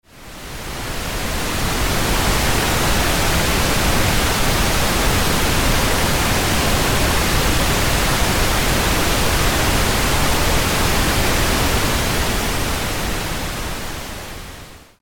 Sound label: Pink Noise; speaker headphone subwoofer burn in